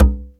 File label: Cajon, EMU Orbit-3 drum machine; 300 Drum Machines